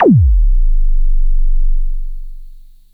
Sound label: thump; Best Service Alpha Dance vol.2; ZAPS+THUMPS